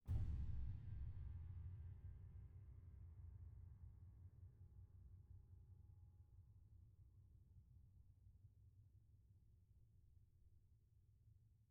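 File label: pedal Down, Grand Piano; bitKlavier Grand; Piano Bar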